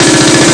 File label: machine gun; Sound Effects for Multimedia - Volume 1